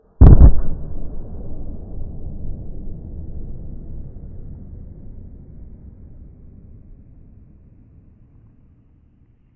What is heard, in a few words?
deep low explosion